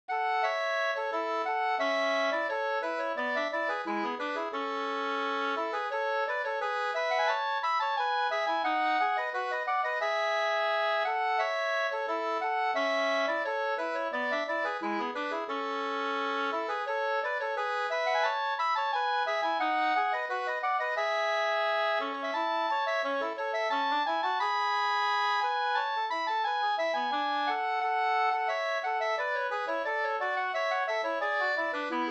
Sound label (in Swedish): ganglat; Solskensganglaten fr Leksand